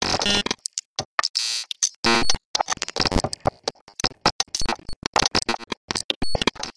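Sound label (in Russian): клики и гудки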